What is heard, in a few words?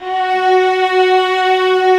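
violas, ambience, forte; String Master Series; Vlas Sect3-4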